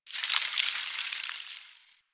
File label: Landscape Ice Slide Small, Ambience; Warframe; Venus Open World; One Offs